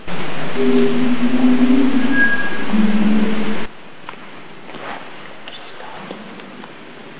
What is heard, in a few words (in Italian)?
Rumore suono; durante lo spunto quantico; AbbaziaVangadizza